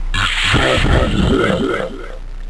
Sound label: spellcast